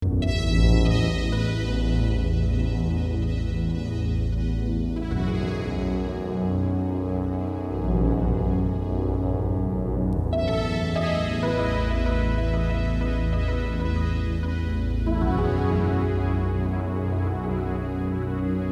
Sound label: Rhythm; PixelJunk Shooter 2; ps3